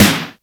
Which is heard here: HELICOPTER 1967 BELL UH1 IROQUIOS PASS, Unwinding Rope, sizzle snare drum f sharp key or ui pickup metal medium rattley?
sizzle snare drum f sharp key